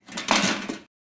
metal falling

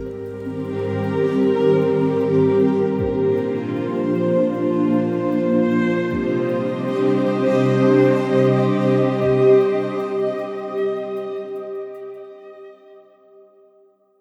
Big Strings, Pads; BB 2HTC Samples Vol 4

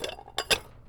Ceramic Bowl; BB Retail Therapy Sample Pack

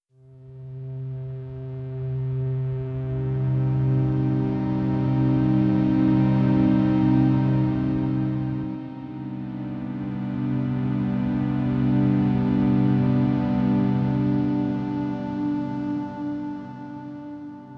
Buzzing Intrusive Thoughts, Pads; BB 2HTC Samples Vol 4

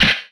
Gun, retro arcade; Guns

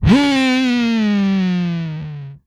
Falling, Vocal; retro arcade

